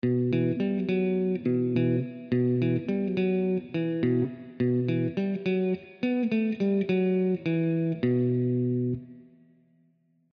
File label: strato wet melo; Chiqui Audio; Melody 22 105 Bpm B Maj; Fender Stratocaster